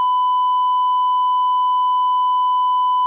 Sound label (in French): bip censure